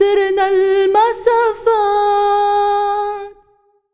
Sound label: SINGER, ArabianTraditions